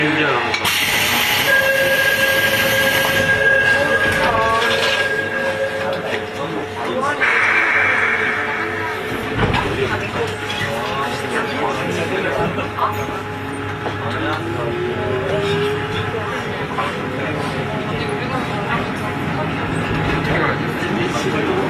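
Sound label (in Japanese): 走行音, 2号線, ソウル地下鉄; Seoul; トクソム(Ttukseom)-聖水(Seongsu)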